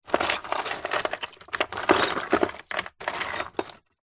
drawer wooden full of loose bits and pieces rummage